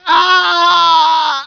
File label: die; hunger; franklin